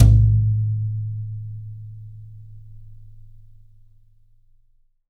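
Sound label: Yamaha 18, brush, drums; Big Fish Audio; Ross Garfield Drums VOL-2; T-YAM BRUSH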